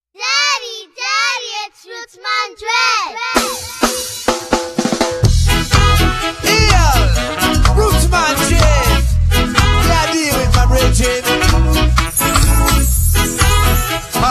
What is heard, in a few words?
reggae